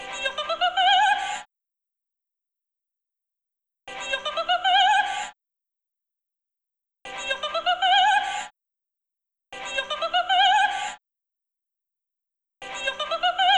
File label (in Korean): 웃음소리